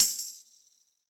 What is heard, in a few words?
Tambourine Mid Direct Natural; Drum Hits; Ableton; Factory Packs; Session Drums Studio; Standard Hits